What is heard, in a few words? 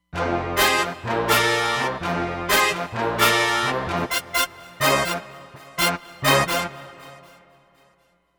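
Pop Stak Brass, Roland Fantom XR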